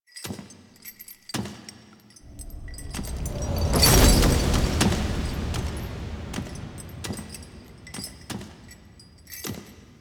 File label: Movement; The New War; Ballas; Cinematics